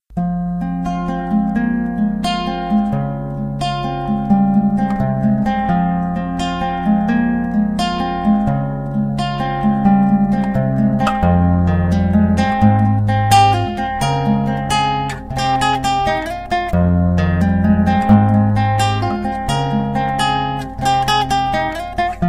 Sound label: guitar; STALKER